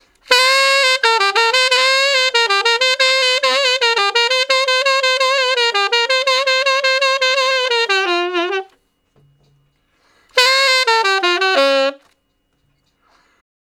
Sax Slo Blus (Bb IV, IV, I, I) pickup, Ten Sax; Solos; Crimson Blue and Fabulous Horncraft 4 RnB; Horncraft for R&B

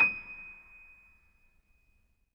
MONO, Acoustic Piano

